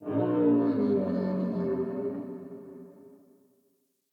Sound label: Forest Open Glade Creature Far; Day